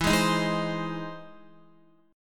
E Augmented Major 7th E down Guitar 4F 4A 5C 5G 5C 6E; strummed